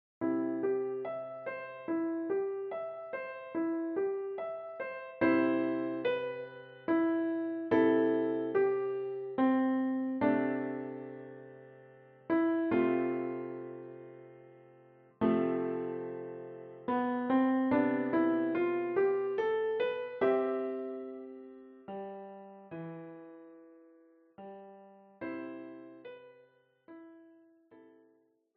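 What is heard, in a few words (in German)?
Der Schwan, Klavier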